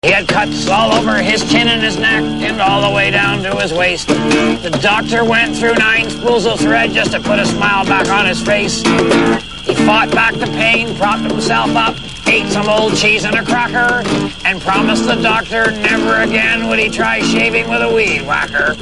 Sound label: shaving; RedGreen; CampFireSongs